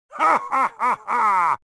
laugh

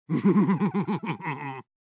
gnome laugh

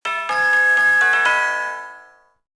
mansion tap; krustys

